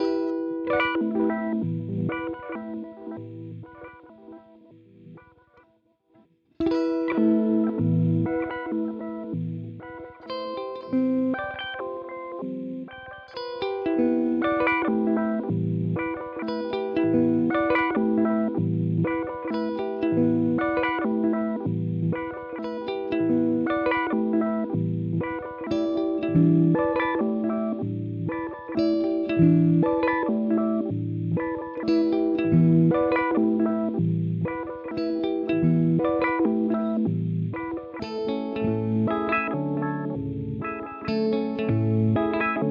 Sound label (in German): Chase Bliss Audio Thermae Step Intervalls